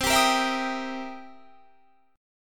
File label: C 7th Sharp 9th C; C7#9 strummed; 20,22,20,21,20,23